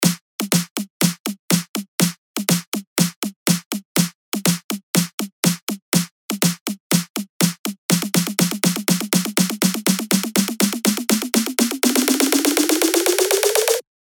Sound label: SNARE ROLL RISE 122BPM